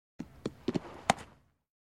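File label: baseball sounds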